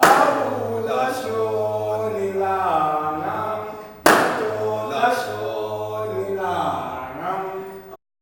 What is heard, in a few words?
MALE CALLS; Spectrasonics; Heart of Africa 2